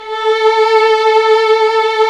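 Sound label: strings, 8pc, vibrato; String Master Series; Vlns 2-5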